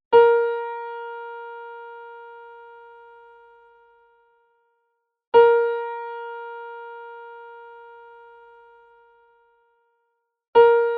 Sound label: TUNING NOTES (Bb CONCERT); Dave Liebman; A Chromatic Approach To Jazz Harmony Melody; Advance Music